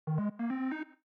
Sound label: upgrade